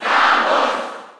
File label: Cheer German; Samus; SSB4